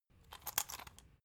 salt mill metallic slow; gastronomie; soundpool

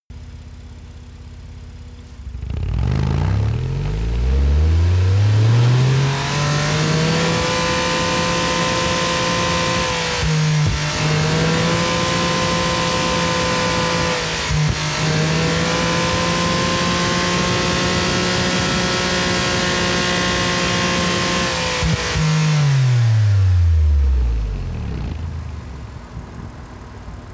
sports car ext engine very high rpm unstable

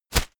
whoosh shredded paper hard; swooshes-swishes

